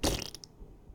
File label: Kill; custarpedo; calamity-cobra-2